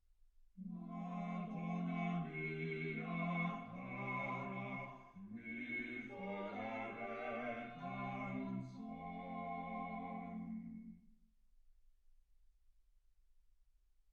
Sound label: quartet